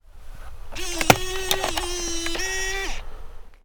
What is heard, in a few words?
eject